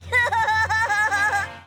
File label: fluffy laugh